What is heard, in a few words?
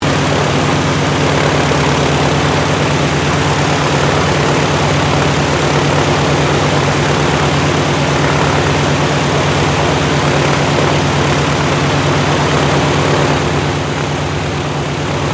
AIRCRAFT LOCKHEED HUDSON IDLE SHUTDOWN, Hudson twin engine light bomber; Airplanes